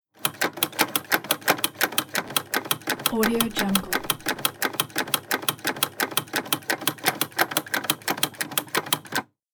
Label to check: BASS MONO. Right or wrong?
Wrong